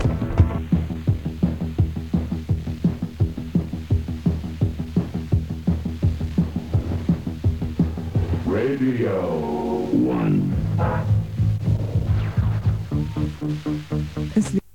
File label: radio one jingle